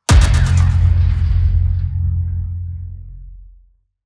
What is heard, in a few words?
Impacts designed; soundbits